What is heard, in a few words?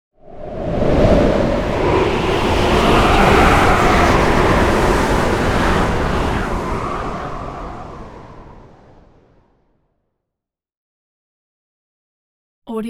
elemental wind cast twister short; soundmorph